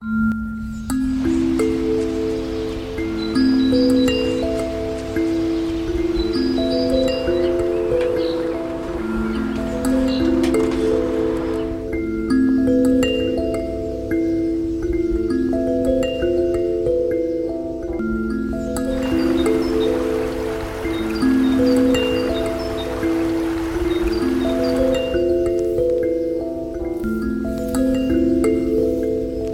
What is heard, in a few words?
hangdrum